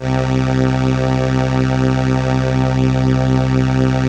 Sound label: Crumar; Masterbits - Vintage Voltage; CRUMAR PERF